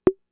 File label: Conga, Fricke MFB-522